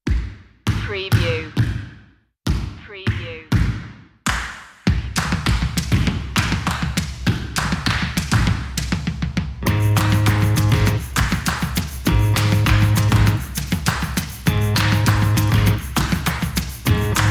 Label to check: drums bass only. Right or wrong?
Right